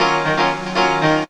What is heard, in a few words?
P. CHORDS, KEYS